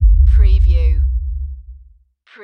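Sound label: Production Element LFE Impact; Sound Effects Bible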